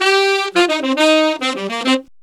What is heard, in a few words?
Sax Section in C min, Jazz; Sonic Foundry (Sony Creative Software); Jazz Solos and Sections